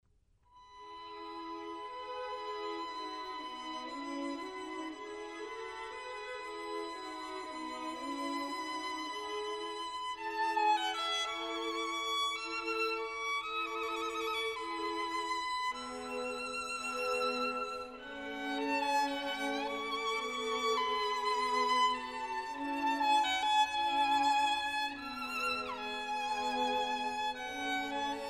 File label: Violin Concerto; Prague Dvorak Chamber Orchestra